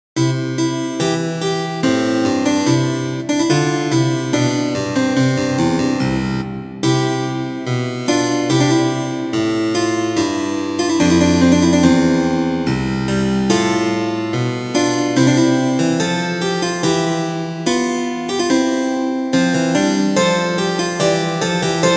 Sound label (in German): kontrapunktstudie; der apfelbaum